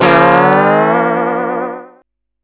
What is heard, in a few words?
Warning, Alcatel phone tones; OT-757